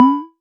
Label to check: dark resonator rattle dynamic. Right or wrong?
Wrong